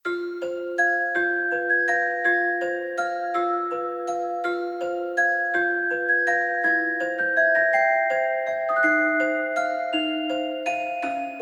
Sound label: Celesta loop